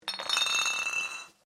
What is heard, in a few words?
Glass Bottles Rubbish Drop, bag of recycling bottles; Materials